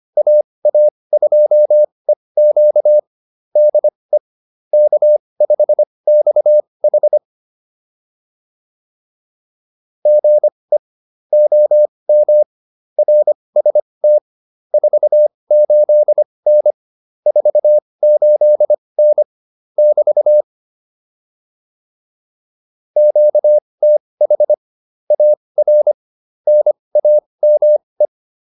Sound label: QSO, advanced practice files